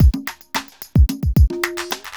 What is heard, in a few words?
evil beats 110